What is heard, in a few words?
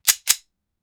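ak shoot sound rifle single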